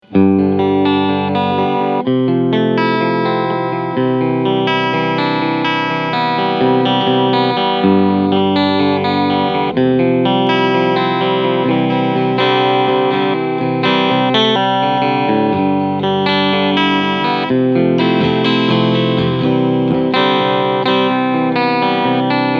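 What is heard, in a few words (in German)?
PRS SE Starla Cleansound beide PUs Singlecoil Mode, E-Gitarre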